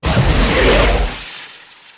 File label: rocket launcher; gfuel